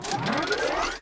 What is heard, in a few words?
Spin up, weapon; lacunae; Nuclear Tech